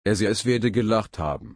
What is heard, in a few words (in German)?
lachen